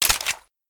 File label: fiveseven clip out; weapons; kuilu